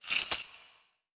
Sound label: buy; World of Tanks Blitz